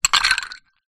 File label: drink ice water glass movement